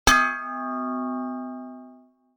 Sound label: pipe hit